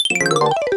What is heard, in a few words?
Menu Deploy; Switch 2